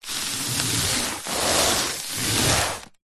foley balloon foil small inflated rub scrape on carpet